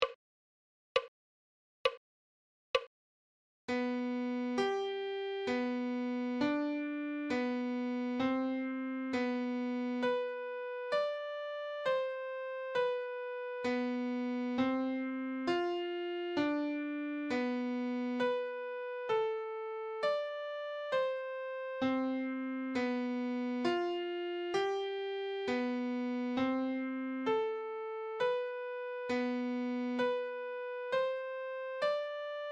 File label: treble clef adagio